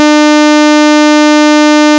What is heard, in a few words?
pitchfreq 300